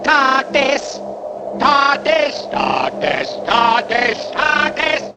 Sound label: tardis